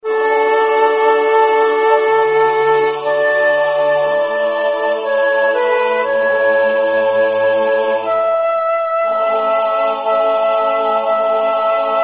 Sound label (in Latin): Sanctus Soprano; Zelenka; Missa Paschalis